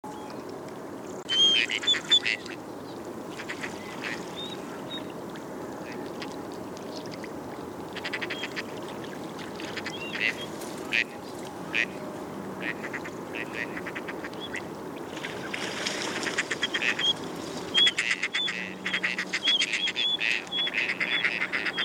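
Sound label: gadwall voice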